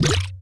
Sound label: Splash; lego my style preschool